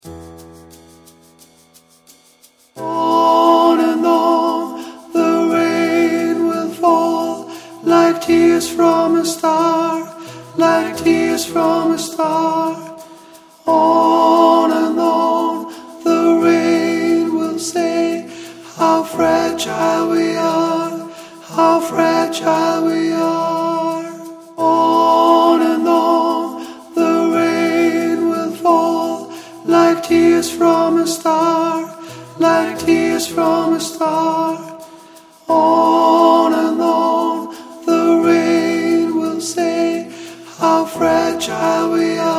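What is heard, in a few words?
chorus alt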